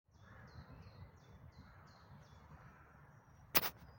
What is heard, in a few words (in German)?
Zilpzalp; Stunde der Gartenvoegel